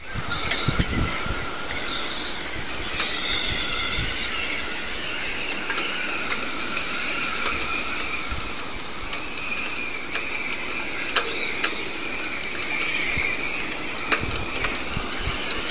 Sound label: wind in the rigging at redclyffe sailing club wareham